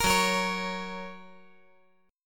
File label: Gb Major